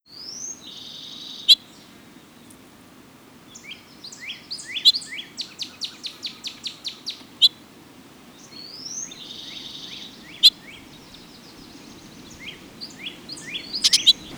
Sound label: Hooded Oriole; Park